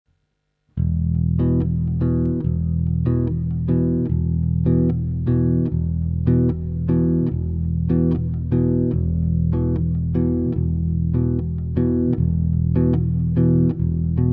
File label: Instrumental